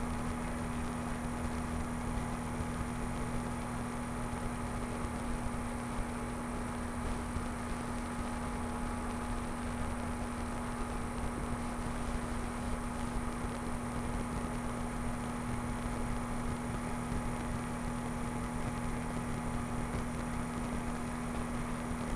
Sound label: Small Fan; Fans and Drones